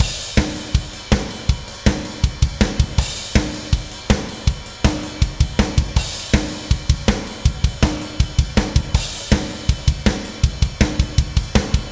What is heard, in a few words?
Battle DRUMS A